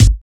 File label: Kicks